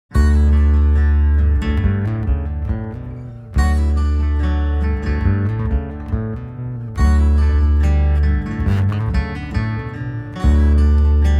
Unplugged; 2014

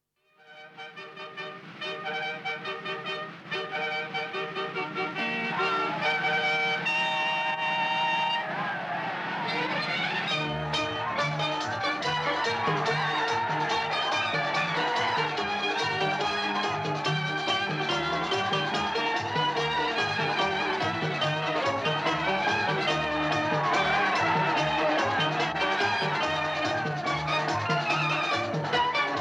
Theme Instrumental; Zabak

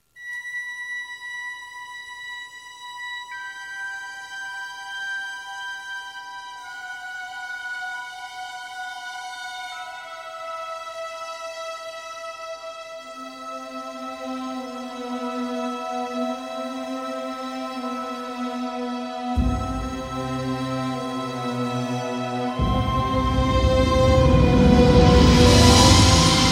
Main Title Instrumental

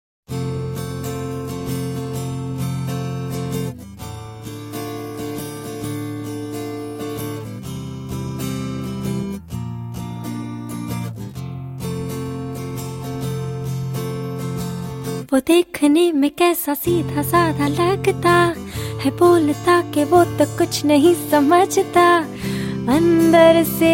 Female Acoustic Version; london paris new york 2012

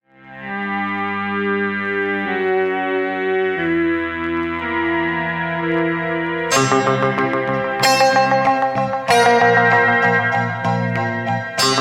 Instrumental

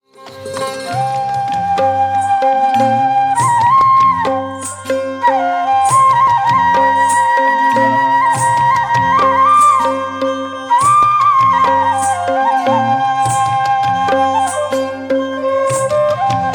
Tribal Theme Instrumental

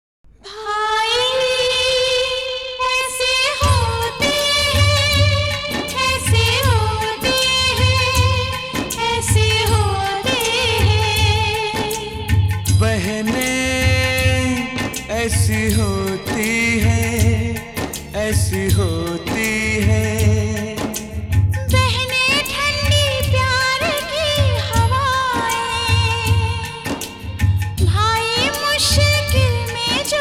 Sad Version; pyar ka devta 1991

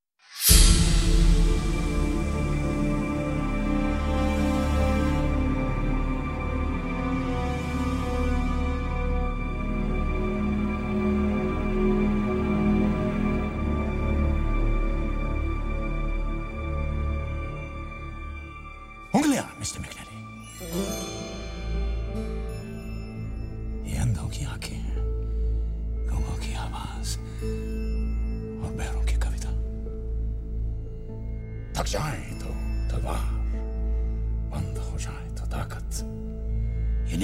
Instrumental

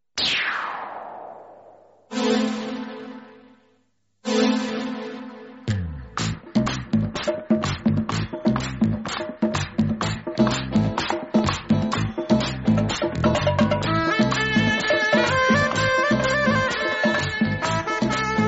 Theme Instrumental; Sharaabi

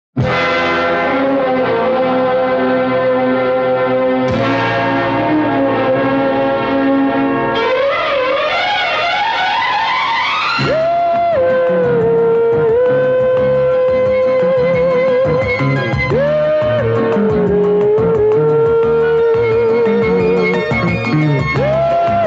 Theme Instrumental; Manzil Manzil

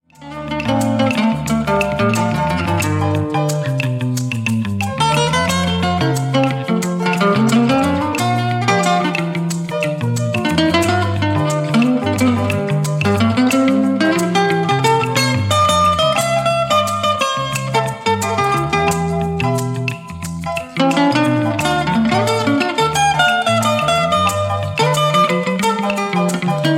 Ectasy Instrumental

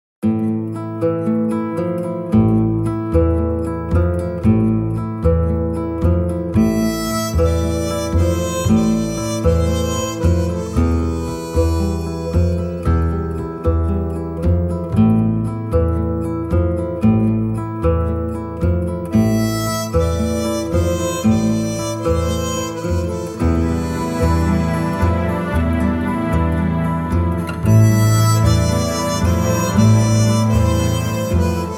Theme Instrumental

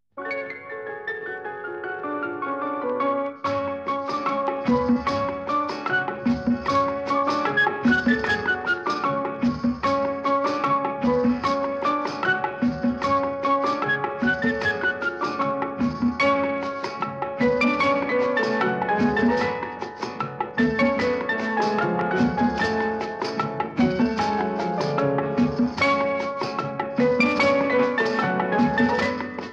Instrumental; Do Kaliyan

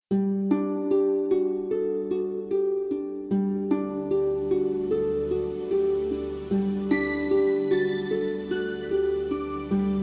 Female Version; revolver rani 2014

